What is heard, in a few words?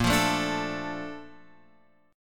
Bb Major 7th, Guitar, Open F; x,5,5,4,5,4; strummed